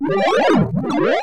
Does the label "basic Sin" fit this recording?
No